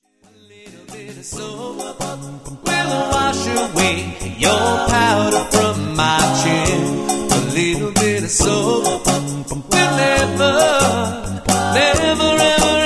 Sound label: Drum Apella Mix